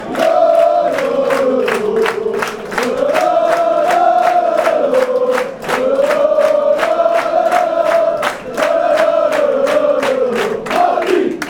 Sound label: oh oh oh madrid